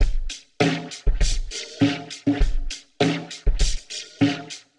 100 Slow Drive, Warped Live Drums; Loops; Touch Loops; CosmicFunk

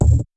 perc; Anthemic.Electro; drum hits

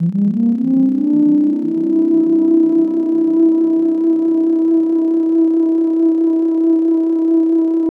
Atmos FX, Atmosphere & FX

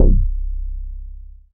BASS MINIMOO

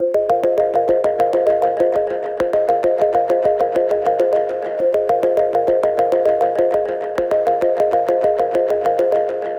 100 Bbm, Music Loops; runforsummer; Touch Loops; BlissedOutBeats

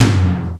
MDTOM

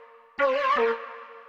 flute, ethno, 160, C

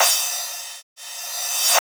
HOUSE CYMBAL 125; Beats

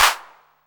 808 CLAP, TR-808

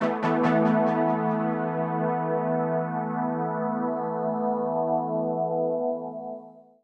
techno pad 140; Techno2; Keys

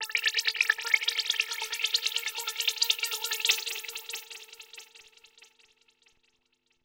TEKNO 140; Techno

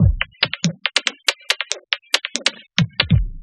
electro beats 140

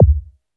HOUSE BD; drum; BASSDRUMS

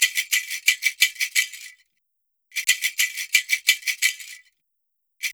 ETHNO PERCUSSION 90; ethno2

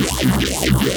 Fillthy Monster C; Harmor; Generators

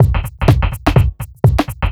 HOUSE BEATS 125

